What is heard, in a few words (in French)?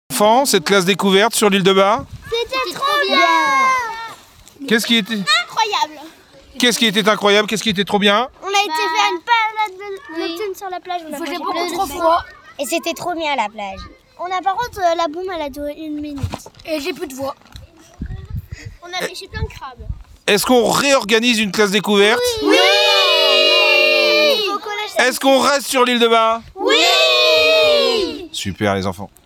tous ensemble